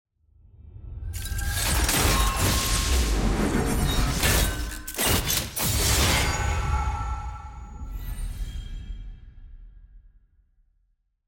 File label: level up; champion mastery